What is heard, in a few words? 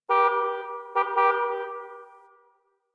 Distant Car Horn